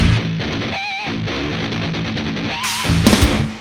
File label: guitar